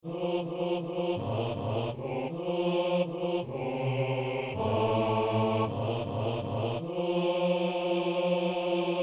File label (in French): psaume basses; Psaume 144 (145)